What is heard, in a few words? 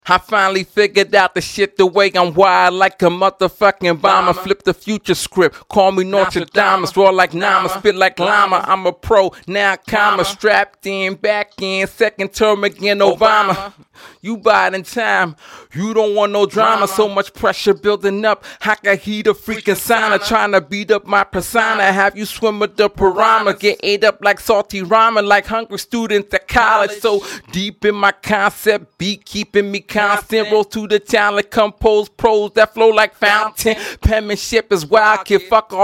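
hip hop 75 Gm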